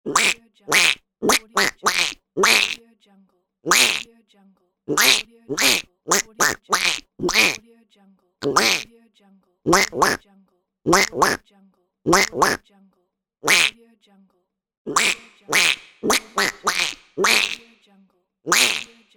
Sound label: duck quack